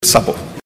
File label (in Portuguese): sapo